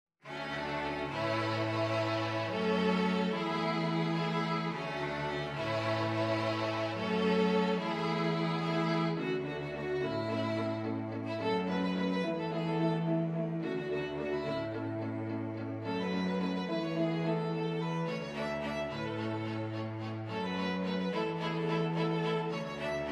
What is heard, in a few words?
Car string quintet, string quintet